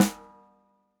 snare, gretsch kit

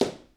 footstep

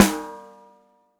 snare, gretsch kit

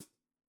hihat foot, gretsch kit

